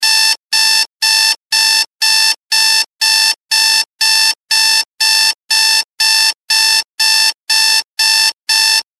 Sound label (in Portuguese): despertador barulhento